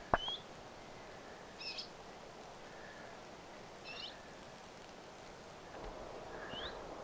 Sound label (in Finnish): tuollainen pajulintulintu